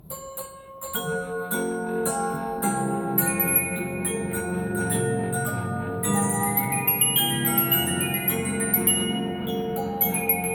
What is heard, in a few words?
15.5" Regina Music Box - Rookwood Console; Style 40 Rookwood